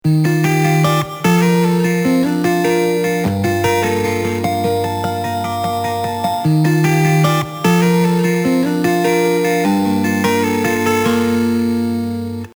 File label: guitar bit crusher; warmth dev